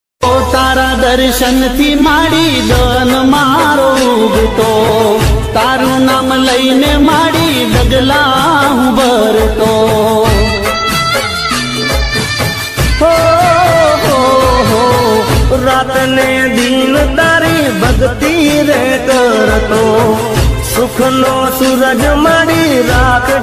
hindi song ringtone garba ringtone song god ringtone; devachi ringtone